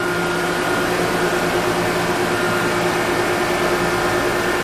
Generating Station, generator

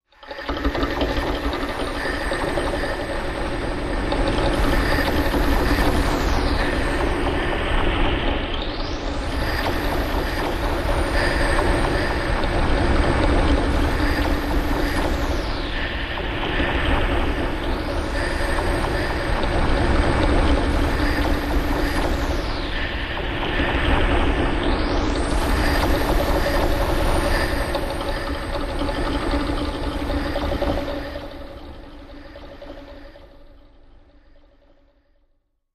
Empty Fear Vibration, sci-fi ambience

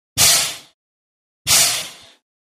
Bus Air Brakes Coach A

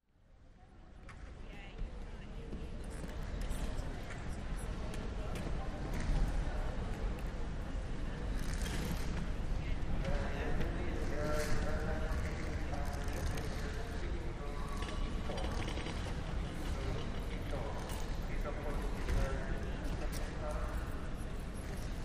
Airport Terminal, int airport

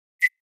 Short Elect Beep Buzz

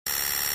Buzzer Ring Mod Tone

